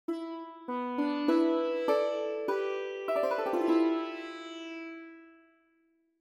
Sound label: sitar; world music